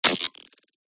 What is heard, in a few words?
Micro Mechanics; PMSFX